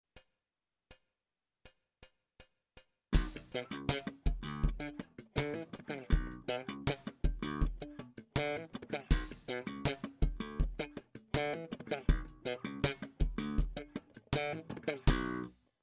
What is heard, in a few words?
Slap, bassoelettrico